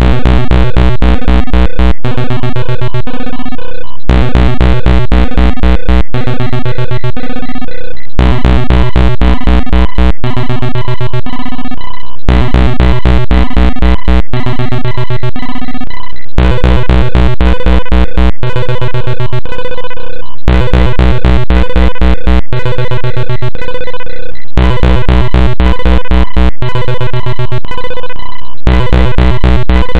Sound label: bytebeat